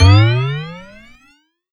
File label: NOISE; USB Soundscan vol.51; House Side Of 2 Step; AKAI; NOISE FX